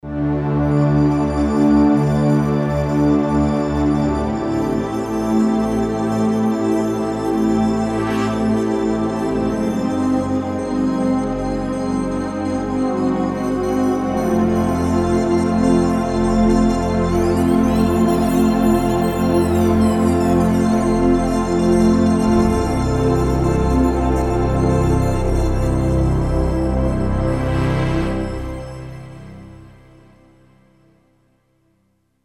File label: Warm Shimmer, Roland JD-990; Vintage Board